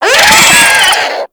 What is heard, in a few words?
Infested Biped Death; Warframe